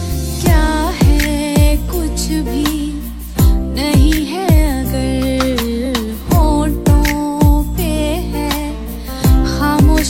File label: Love Song Ringtone